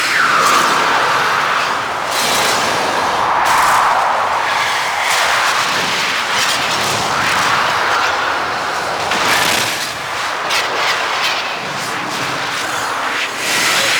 Void Storm Close Wind Loop; Gameplay; Railjack; Warframe

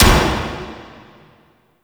Fist Impact Metal; Enemies; Warframe; Tyl Regor; Fists

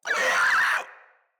Infested Crawler Pain; Crawlers

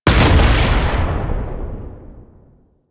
Underground Attack Impact; Enemies; Abilities; Warframe; Proto Infested; Babau

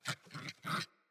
Puppy Idle Growl Playful; Kubrow

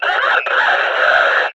Enemy Death; Warframe; Lasrian; Battle Barks

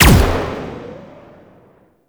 Rifle Alt Shot; Weapons; Warframe; Zariman

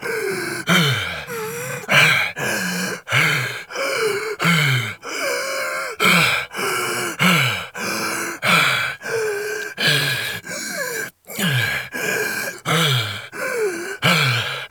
Breath Running Loop; Warframe; The New War; Kahl; Breathing

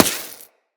Laser Impact Generic

